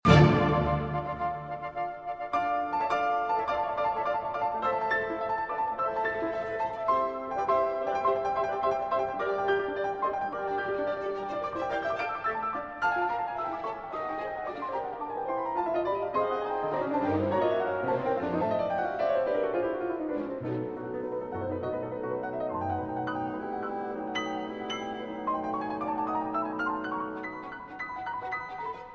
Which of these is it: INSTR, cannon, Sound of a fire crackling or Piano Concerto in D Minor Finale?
Piano Concerto in D Minor Finale